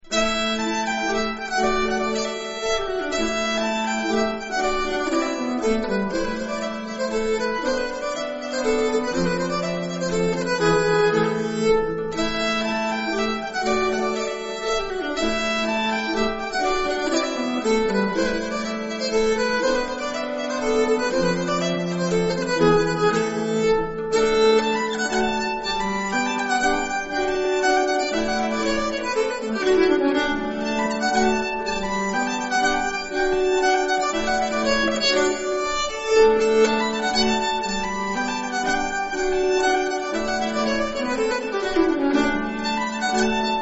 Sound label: bingsjopolskan, nyckelharpa